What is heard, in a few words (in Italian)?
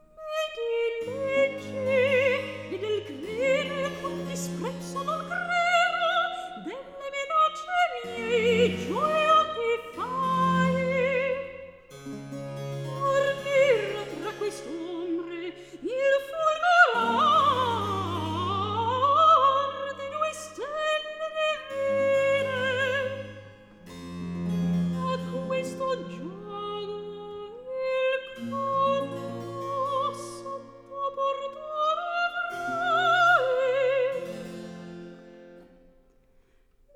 Recitativo; Mi dileggi; Alessandro Scarlatti; Duet Cantatas